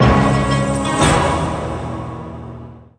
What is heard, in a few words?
medium Win; netent; bloodsuckers